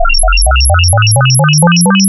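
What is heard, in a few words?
AM Simple Sine HF1, wavetables, Amplitude Modulation; Architecture 32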